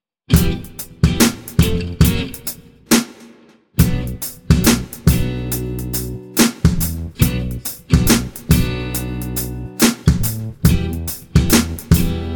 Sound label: Little Groove